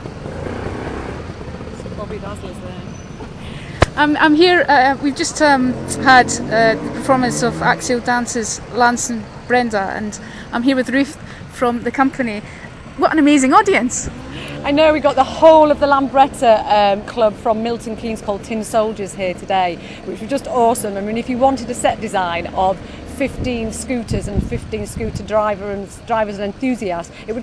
audience at if milton keynes international festival